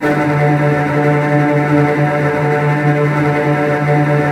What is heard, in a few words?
D2, Virtuoso Strings; ILIO; Kirk Hunter Virtuoso Strings VOL-2; 10CL TRM FX